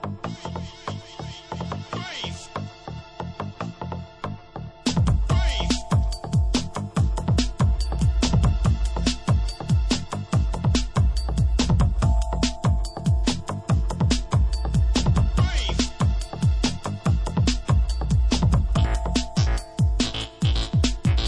bass

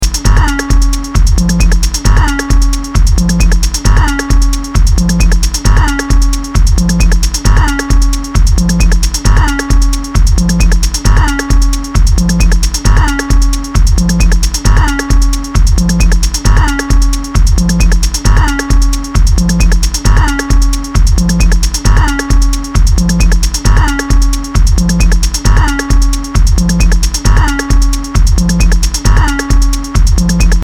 locked groove

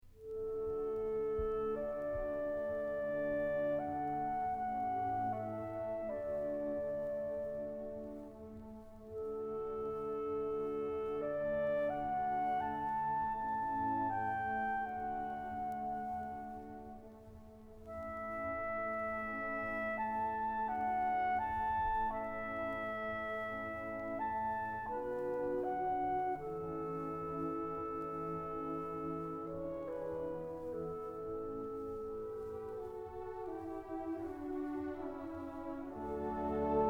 Concerto for clarinet and orchestra in a

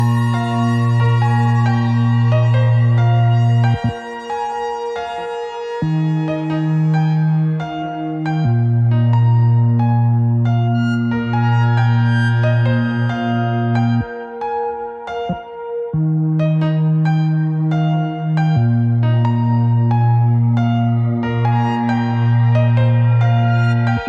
Piano Music

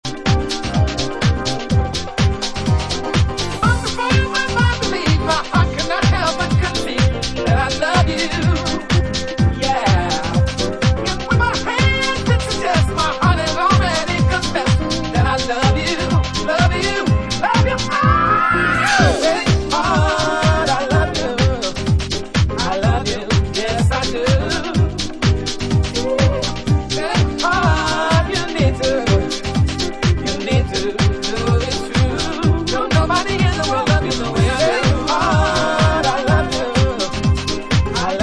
vocal

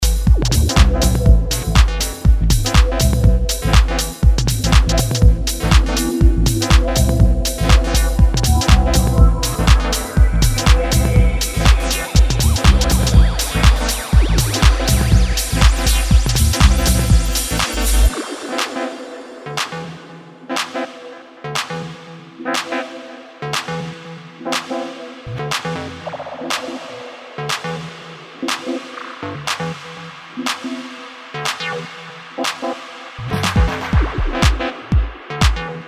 Sans Snare

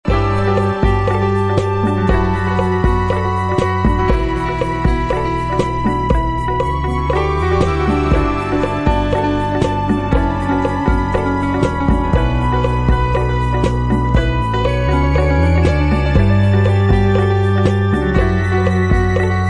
Instrumental

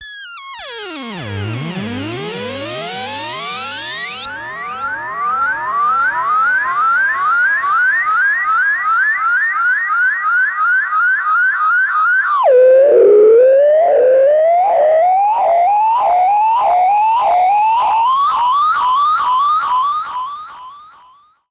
Disco Dub Fx

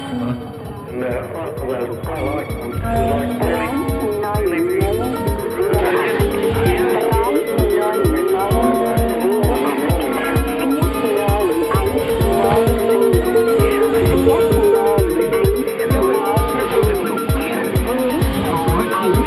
Saxophone

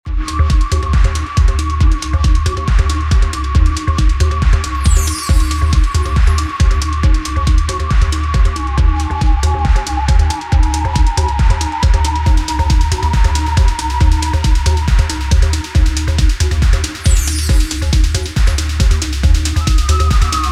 Fort Drums; Trance

